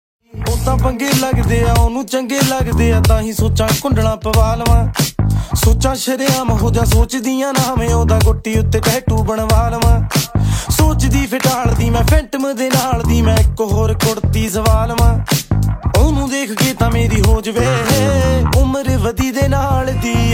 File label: beautiful punjabi ringtone